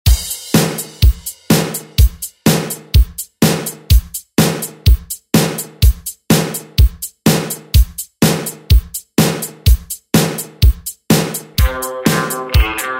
80s Re Drum

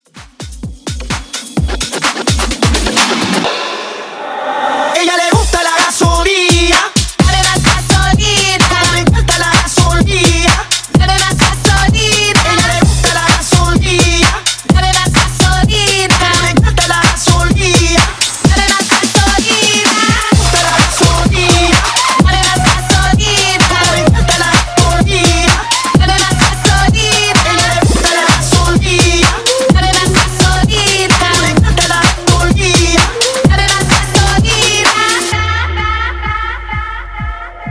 Dance Intro 128 BPM; ReDrum

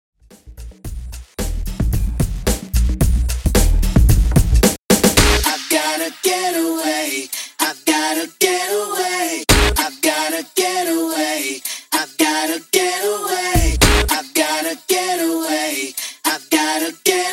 90s hiphop 111 bpm

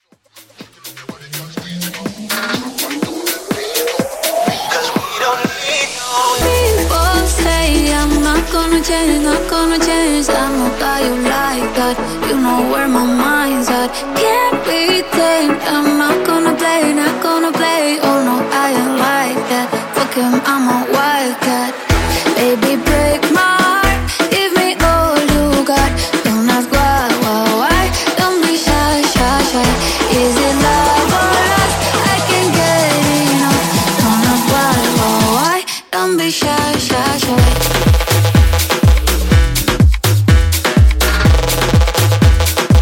Latin Bootleg 124BPM

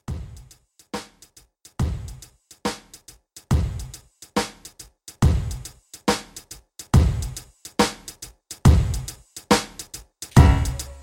Pop Mixshow Re-Drum 70

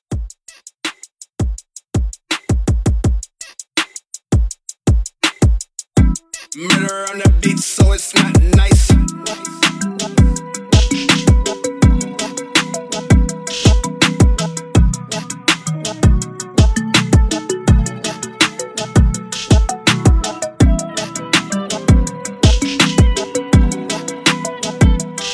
Mixshow Re Drum 82 CLEAN

